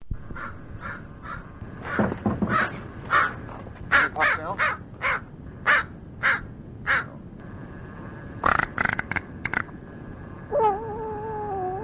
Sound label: crow talk; psittacus